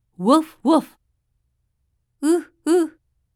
woofwoof

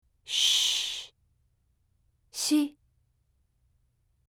shhh

